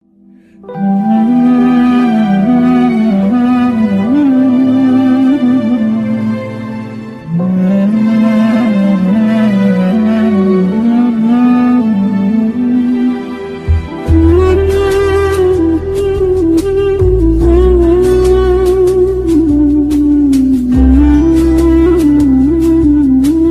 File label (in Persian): music arabi ghamgin